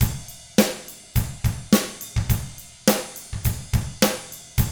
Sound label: 105 POP RK, Drums; ILIO; Double Platinum Drums 1